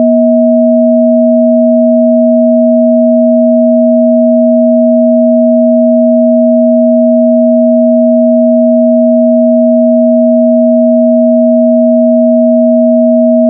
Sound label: two sine ring mod; Digital Audio FX